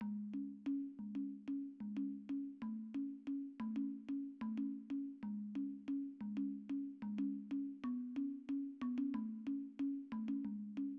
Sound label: Log Drum